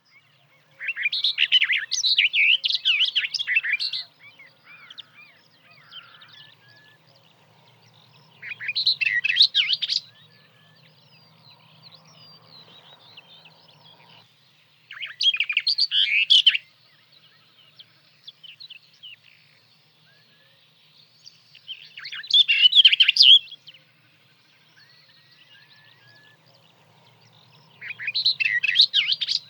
hoksangare, fagelljud

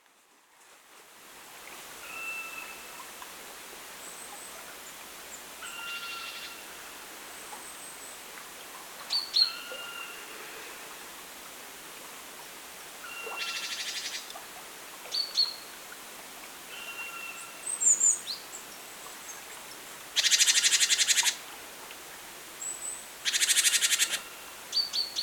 spillkråka talgoxe; Jät 9 januari 2020; fågelljud

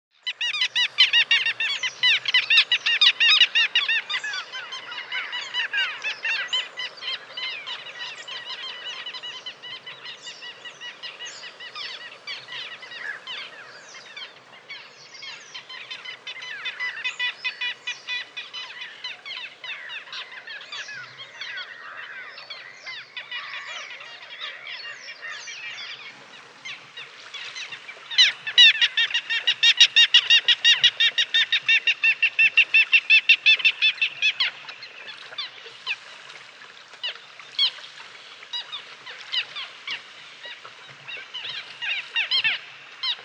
dvargmas; fagelljud

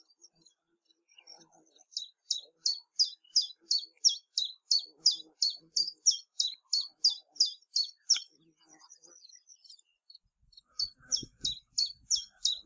gransångare; Djurstad träsk, Öland 8 juni 2018; fågelljud

